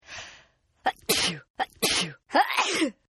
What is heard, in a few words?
Sneeze Attack, Sneezing; Human; Partners In Rhyme